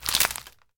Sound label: Crak Branche